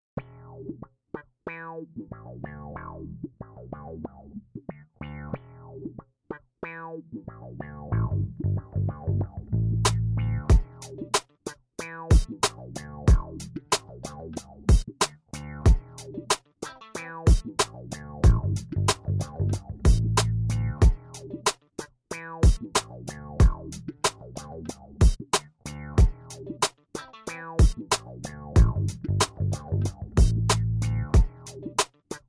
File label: funk